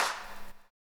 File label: Snares & Claps, One Shots